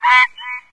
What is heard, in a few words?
small frog, frog groups; ambient; random animals; Left 4 Dead 2; Source Engine